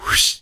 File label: whiz